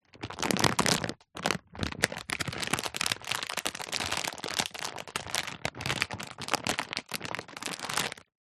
foley plastic packet thick crumple handling

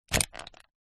industrial tape measure plastic lock switch

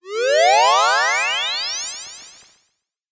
cartoon magic ascend spell; zapsplat

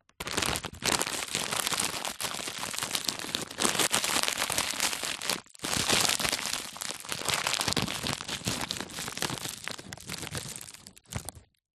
food hand in packet sweets candy rummage

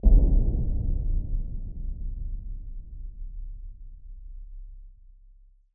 Impact Boom Rumble; bottlerocket

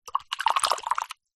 office paint brush artists wash in cup of water

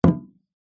instrument drum gamelan hit single

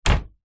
household wardrobe door close freestanding wooden

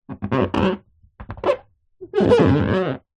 sport surfboard body hand rub squeak

